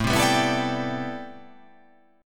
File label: A Minor 11th A, Chord